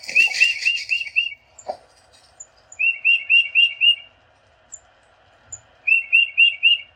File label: Tufted Titmouse